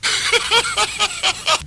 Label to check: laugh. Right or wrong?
Right